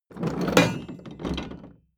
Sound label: metal box roll smooth; maschinen; soundpool